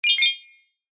multimedia alert notification glassy high pitched short positive